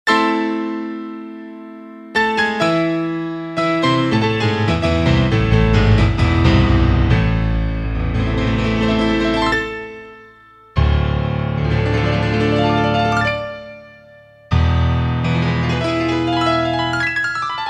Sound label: Greig Piano Concerto